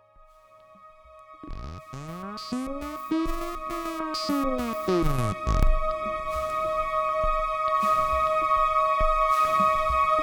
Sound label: ms20 mix